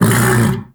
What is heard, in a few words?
Undazoa Vocal Tranq Grunt; Warframe; Hunting Critters; Characters